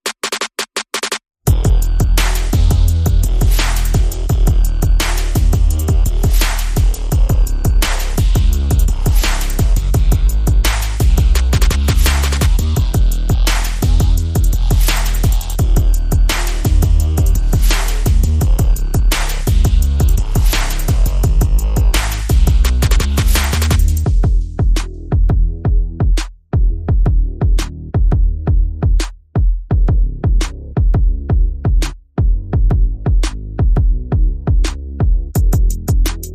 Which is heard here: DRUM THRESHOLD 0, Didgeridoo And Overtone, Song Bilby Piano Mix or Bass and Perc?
Bass and Perc